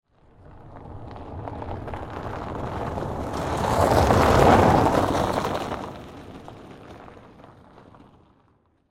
Tires Pass By Snow, Transportation, Automobile, Tires, Pass, By, Snow, Ice, Crack, Slow, No, Engine, Saturn, Vue; Car; BlastWave